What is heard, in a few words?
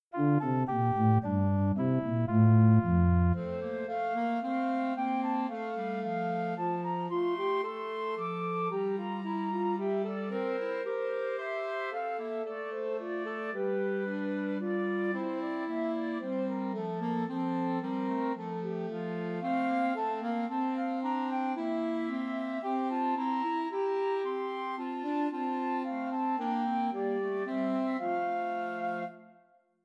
Away in a Manger Christmas Trios for Flute Clarinet and Alto Saxophone